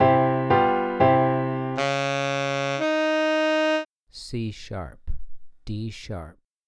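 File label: Tenor Sax 2 Note Melodic Major c#2 d#3; melodic ear training; melodic ear training for musicians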